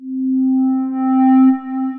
Glass Armonica, Synth Pad; Ableton; Factory Packs; Synth Essentials

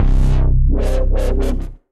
Wowble Bass Rack; Synth

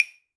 Claves; Wood

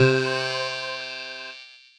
E P wind; Ableton; Retro Synths; Waveframe; Waveframe Lead

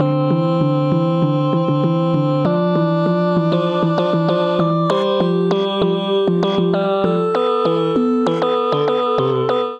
Chromatic C Major 98 bpm; Candyland; Voice Box; Transformed

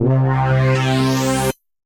Effects; Freak Out

